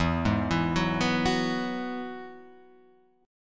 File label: E Major Add 9th, E, Guitar, Half Step Down